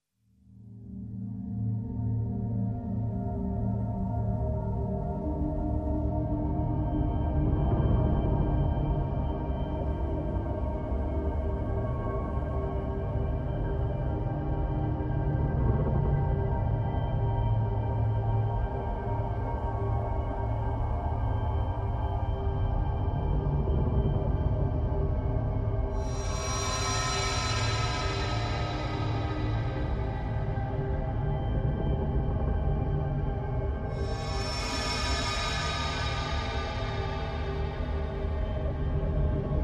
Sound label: Drones Only